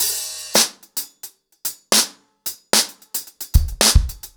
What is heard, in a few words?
110BPM, acoustic drums, drumloops; The Roots Drumloops; Zero To Ten